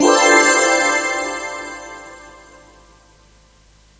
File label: sun coin; ROMAN